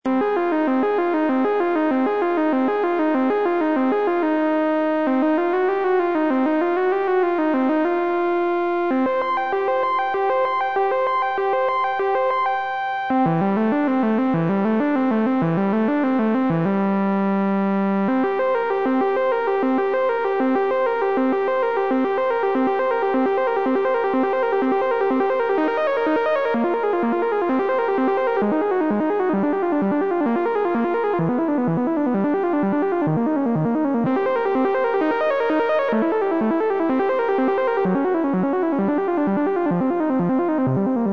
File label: arpeggiate it; PSIM-1